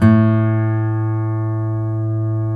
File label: STL NV A 2C, FOLK STEEL Guitars; Spectrasonics; Hans Zimmer Guitars VOL-1